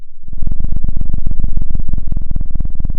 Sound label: pop, Sound of a cat purring